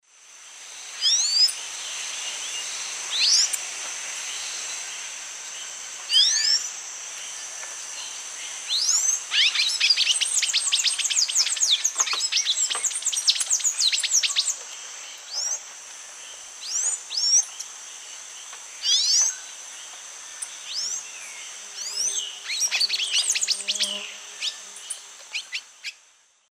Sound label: Thraupis palmarum, calls and dawn song; S. America; Eastern Lowlands